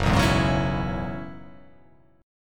F sharp 7th Suspended 2nd Suspended 4th F sharp down